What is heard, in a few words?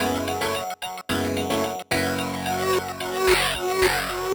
F# 110 Dry, MELO + FILTER; MonteCarlo; RoBKTA Sample Pack Supreme